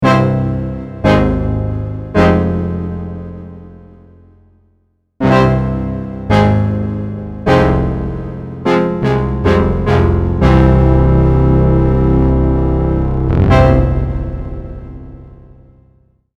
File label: Distant Cut Verb, Prophet '08